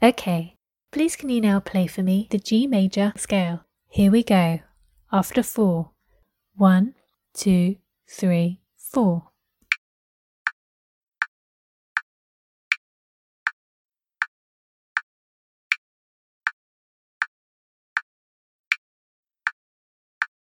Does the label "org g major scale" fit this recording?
Yes